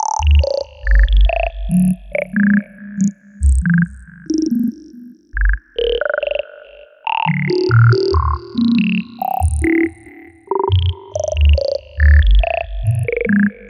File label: synth; widdagroovebox